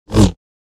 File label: Saber; FXHome